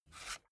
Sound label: cassette case take out soft; objekte; kassette